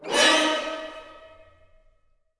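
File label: music stinger mid; Hellboy The Science of Evil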